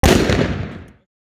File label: Firecracker Explosion; SFX; IRL Recorded